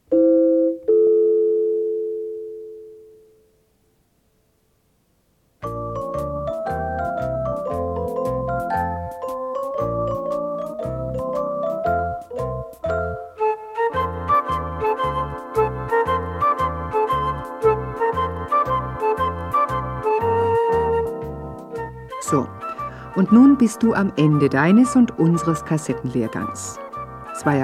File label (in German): Spiel Blockfloete